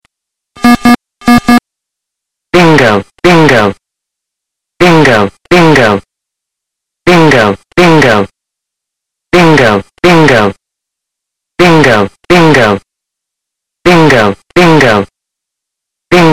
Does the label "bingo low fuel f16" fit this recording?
Yes